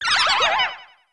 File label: wiggler defeated